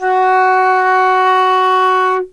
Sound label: Fs4, Basic Flute